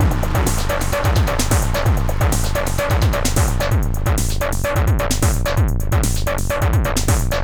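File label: core 129 C minor, hardcore breakbeat; Big Fish Audio; Hardcore Breakbeat Action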